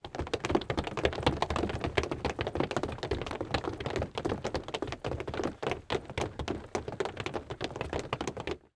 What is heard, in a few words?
Human Crowd Running In Place